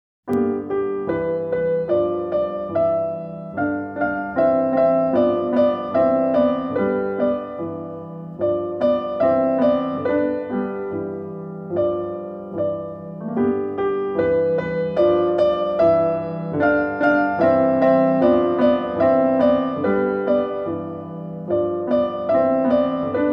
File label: piano